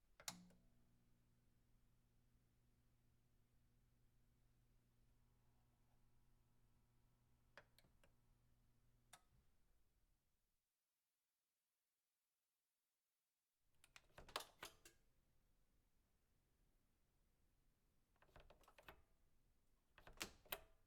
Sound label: button push, household